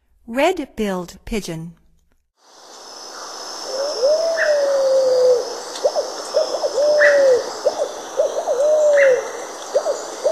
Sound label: Red-billed Pigeon, Songs of Birds of North America; Stokes